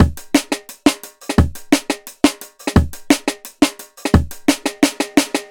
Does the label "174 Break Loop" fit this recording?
Yes